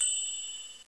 High Bell; CopyThru 2.5

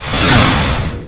WIPE; Sega

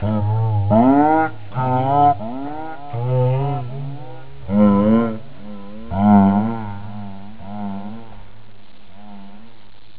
sing; speech

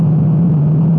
rumble; Zaero

